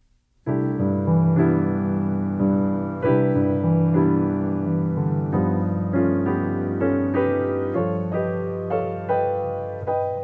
piano

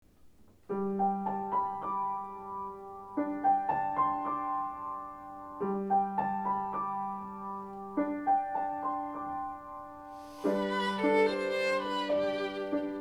violin and piano

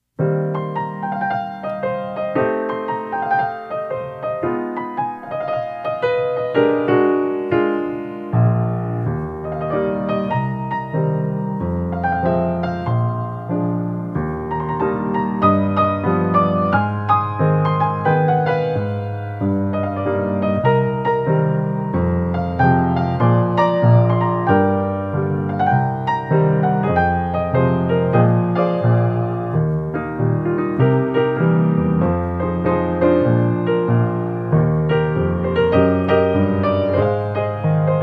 piano

